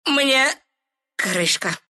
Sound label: death, ru; Meepo